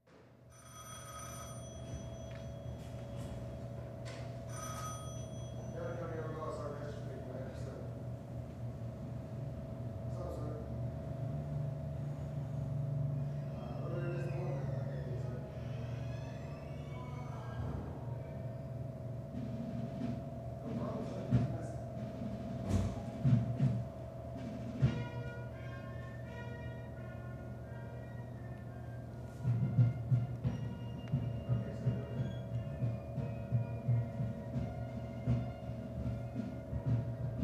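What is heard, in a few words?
Barracks Room Amb W Voices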